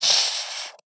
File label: marker, Pencils; CB Sound Design; Essential Sounds Vol.02